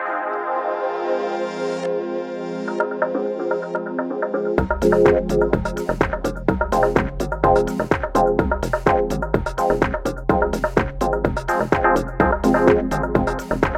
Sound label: BoomBox Track loop